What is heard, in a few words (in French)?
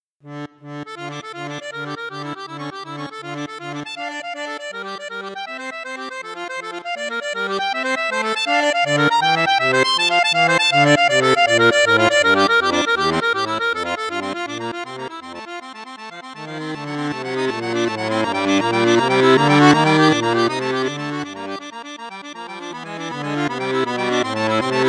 registre 16 4, accordeon; borsini concerto medio